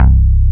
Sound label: Bass